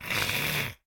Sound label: Goblin Hiss; SyrinScape